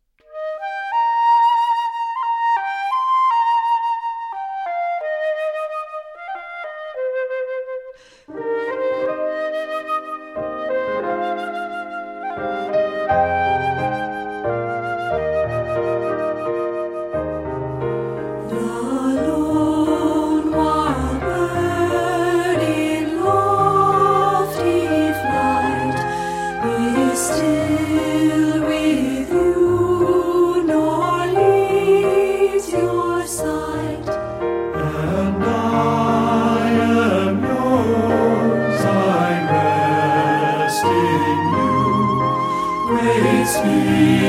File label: lone wild bird SATB